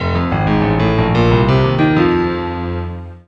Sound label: BLUE PIAN